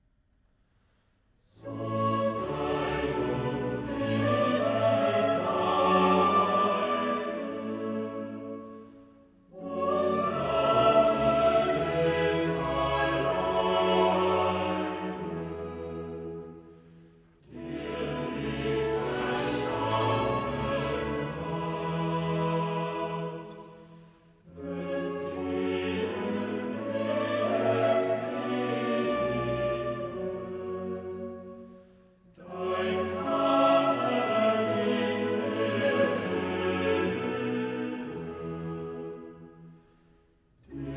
Choral SATB; Bach; Cantatas; BWV 44; So sei nun, Seele, deine

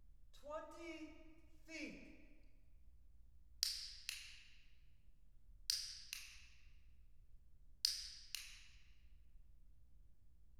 Depth of Image, Acoustic Clicker: 20 Feet

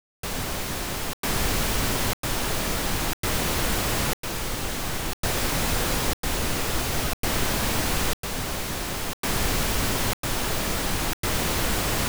Stepped wideband Surround-Sound frontal pan; Surround Sound Setup and Imaging; Surround Spectacular

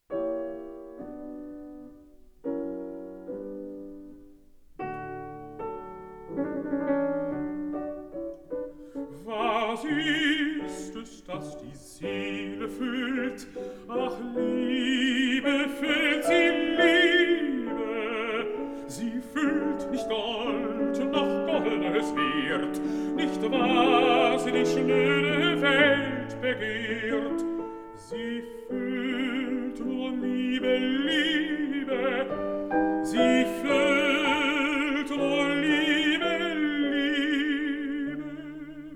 song for voice & piano

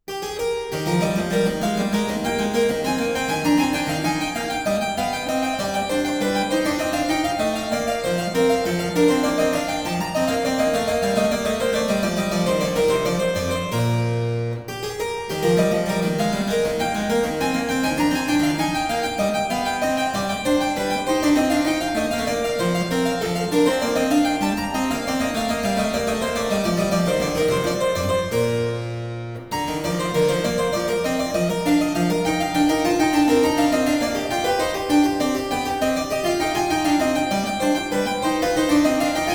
French Suite, for keyboard in E major, Bourree; BWV 817 (BC L24); Bach

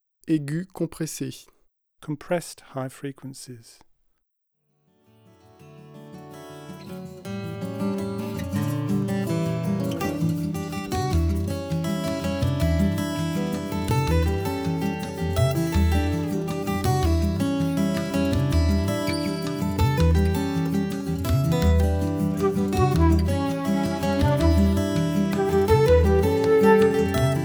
25Hz to 20kHz compressed

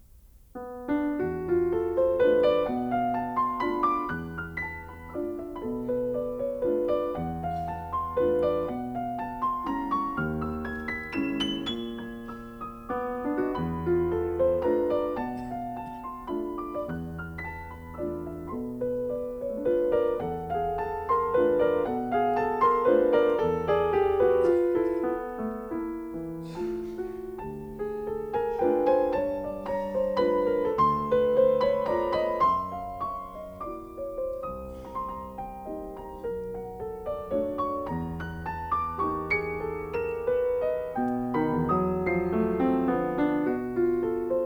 for piano, Con una dolce lentezza; Visions fugitives (20)

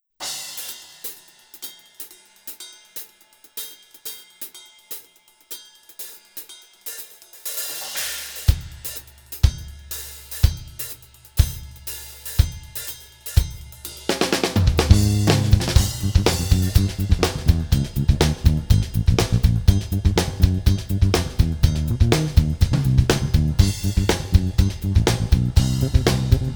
Bass line, Drums

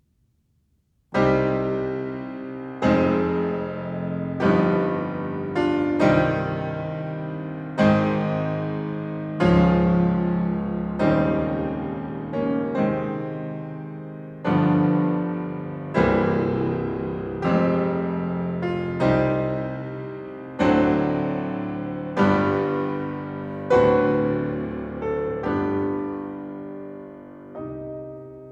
Preludes for piano, No. 20 in C minor; Chopin Waltzes 4 Scherzos 26 Preludes

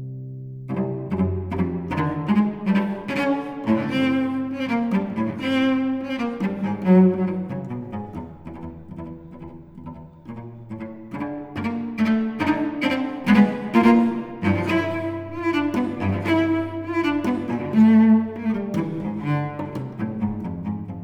Suite for solo cello, Marcia; Britten; Violoncello Solo

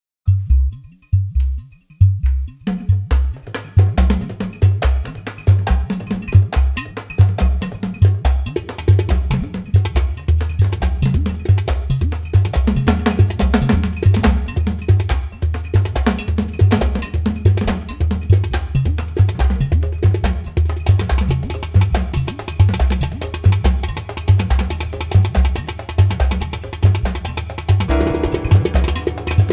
Overture; Gershwin

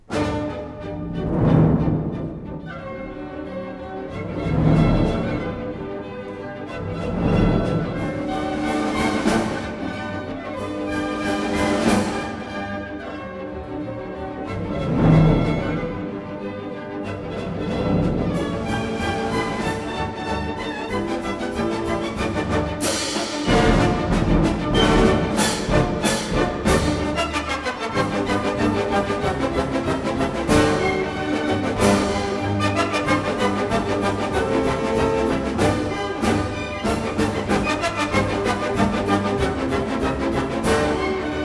polka schnell for orchestra; Unter Donner und Blitz (Thunder and Lightning); Strauss

